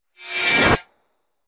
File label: Swing; Smite; god Horus